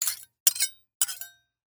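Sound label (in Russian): ресторан металлическая вилка выскабливание металлический нож звук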